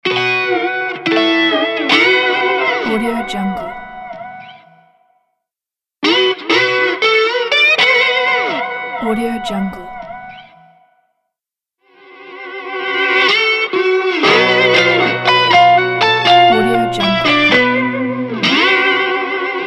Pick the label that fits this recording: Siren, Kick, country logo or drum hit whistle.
country logo